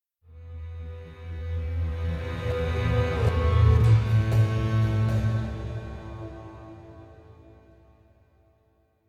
String; Assassin's Creed Unity

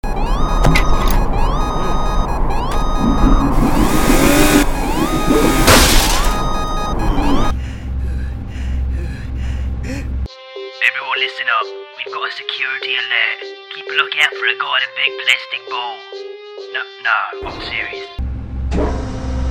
Speedy Hamster Ball; Infiltrating The Airship

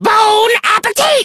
Bone Flingers Walking Army

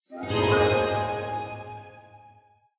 Prize Extra Stinger

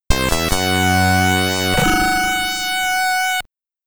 Game Over, SID Stereo; Munen; Ninja Spirit; commodore 64

